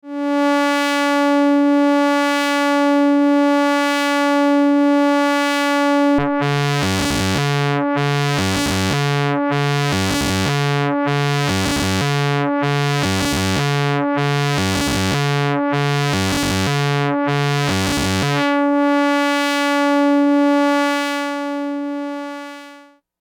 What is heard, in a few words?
fm LFO